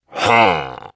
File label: pillager grunt; mobs; mobs mc